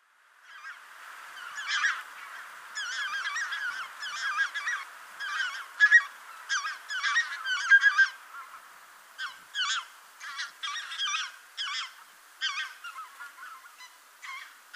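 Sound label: Lesser White-fronted Goose; karigane; tome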